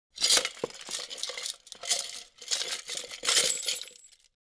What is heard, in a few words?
Can of Change Searching; Fairlight Sound Lib